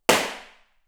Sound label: barn house